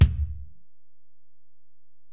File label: Ac BD, Alesis DM-PRO; 300 Drum Machines